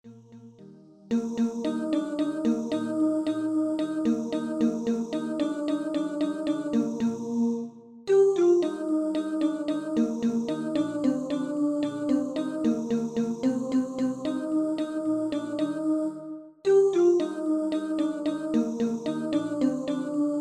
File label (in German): Alt oder Tenorlage